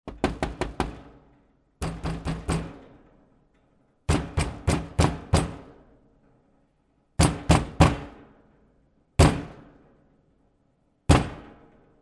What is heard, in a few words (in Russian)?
postepenno razdrazhajas